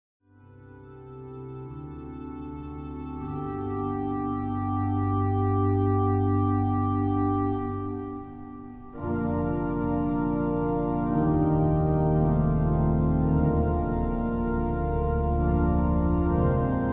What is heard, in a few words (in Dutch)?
Improvisatie Psalm